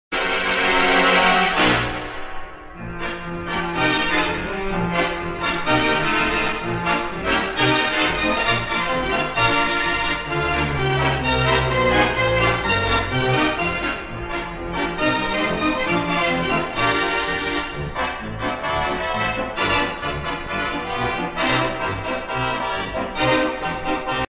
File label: theatre organ